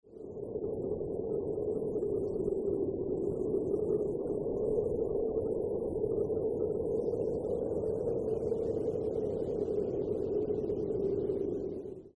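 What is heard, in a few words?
veter s poryvami, gusty wind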